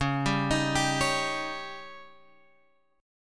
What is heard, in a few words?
C sharp Suspended 2nd Flat 5th C sharp Guitar Open G